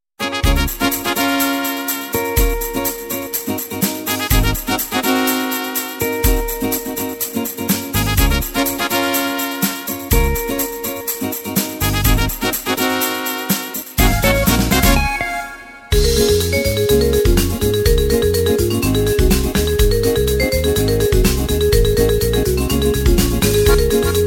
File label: Polka Latino